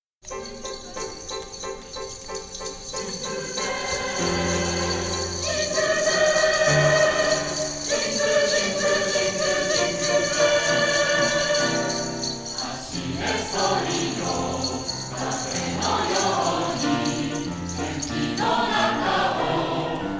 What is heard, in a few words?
Jingle Bells; ジングルベル J.ピアポイント作曲; 全体合同演奏